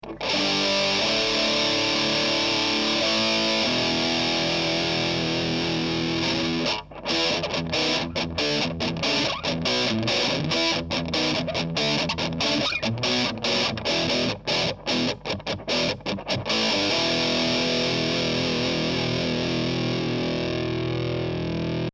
steg volle Zerre

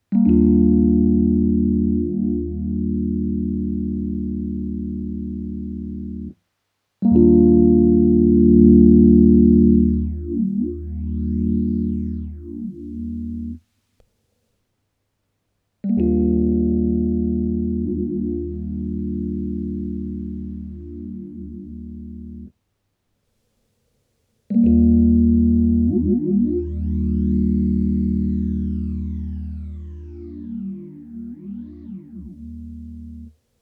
Phaser Sweep 6St 12St ohne und mit Resonanz

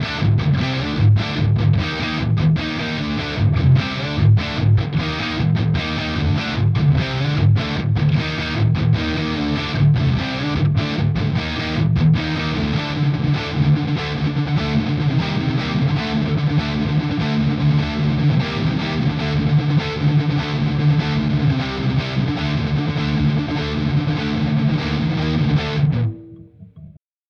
Jackson Signature E Gitarre Neck High Gain

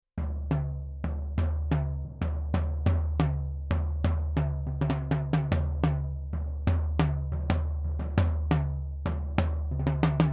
Toms Niere; sE Electronics T2

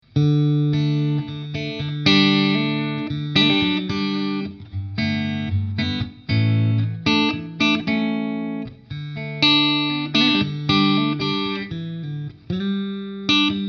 Beide Pickups parallel clean

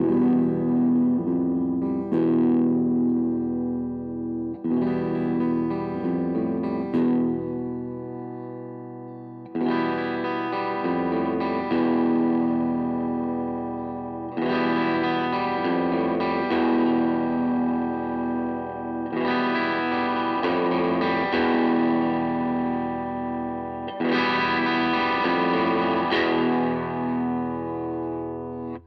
Maybach Harley Benton Vergleich Maybach Tone

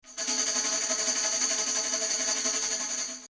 Omni Tambourin 160cm back 180

